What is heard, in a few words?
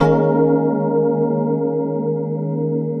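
Electric Piano; Keys; Keyboards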